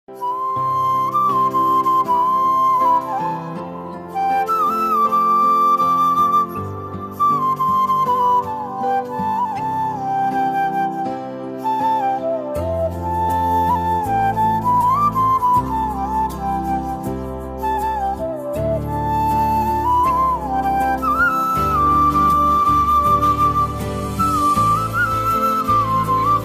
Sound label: flute ringtone instrumental ringtone love ringtone